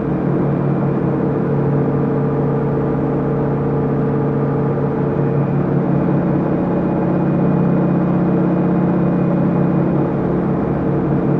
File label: distance airplane idle; Hearts of Iron IV Death or Dishonor